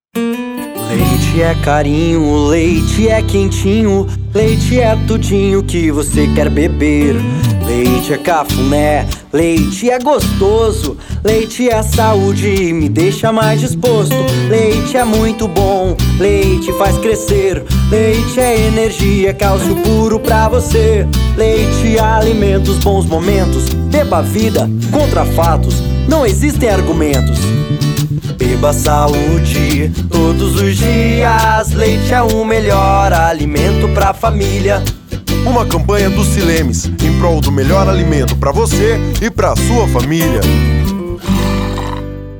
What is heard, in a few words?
JINGLE; SILEMS